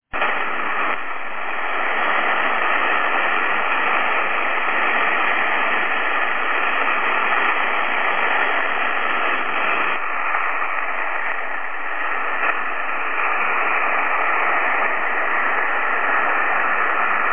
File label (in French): test roofing filter bruit 6khz puis 3khz; Bruit de bande seul; ft2000; 27 MHz (Phonie)